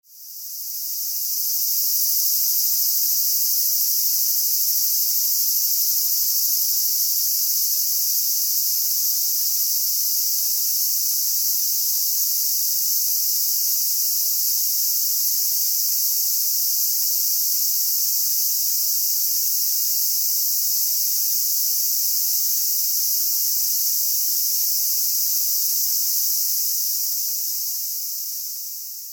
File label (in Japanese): natu